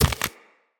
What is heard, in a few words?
Root Spider Mother Step Dirt